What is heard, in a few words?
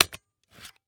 Industry Tool Power Drill Battery Remove Battery